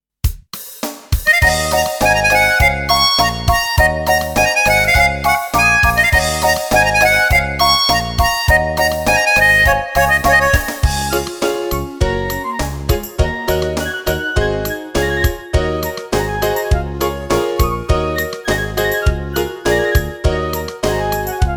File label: karaoke